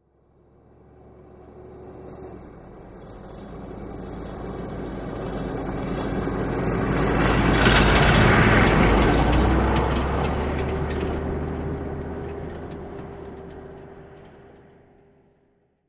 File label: Humvee Med By Rattles